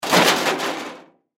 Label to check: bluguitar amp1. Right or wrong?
Wrong